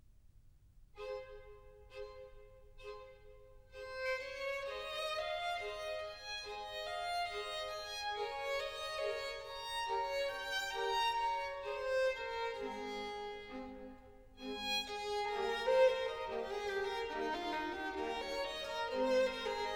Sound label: Violin Concerto No.5 in A major Largo; R.519; Vivaldi